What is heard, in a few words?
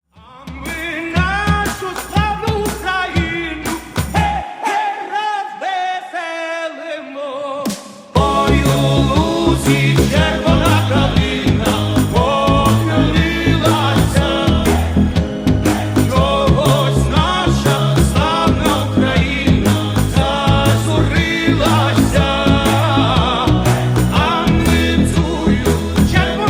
ukrainian folk song